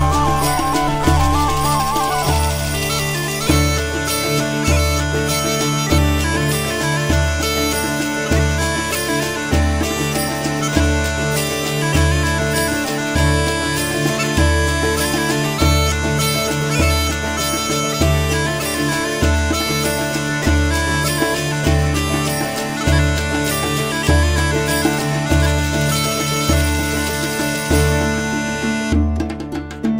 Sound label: Saltarello Italian Ringtone